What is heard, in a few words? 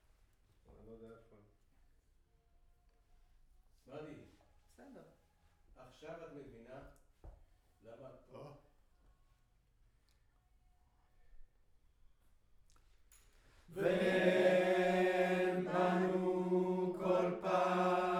Room, Choir